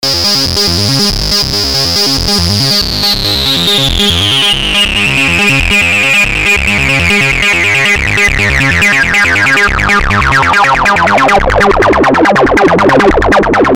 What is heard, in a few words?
noisy 303; Buzz plugin; TB4005